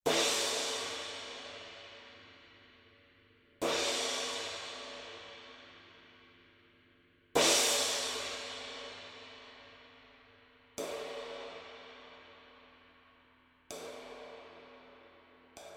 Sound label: 20" Dirty Crash (Groove Series), Bosphorus; cymbal; 1516g